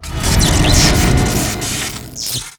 electric shock cast

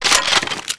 Weapons Gun Ready

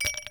drop glass

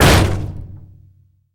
Metal impact

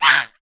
ball red

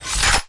Bow ready

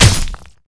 SWORD

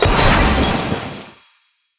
Seal Teleporting

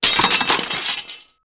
Weapons drops Swords

